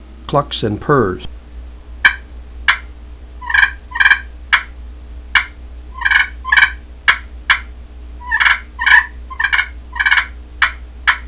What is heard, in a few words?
clucks purrs, turkey; canecreek